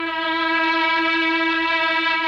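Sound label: MELLOSTR, Mellotron Violins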